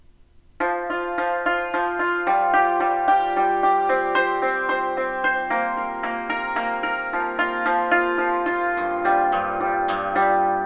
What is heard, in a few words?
octaves, Yangqin; Umik cal